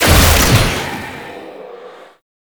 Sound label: Puzzle Shrine Dome Start; Warframe; Duviri Murmur; Medusa Puzzle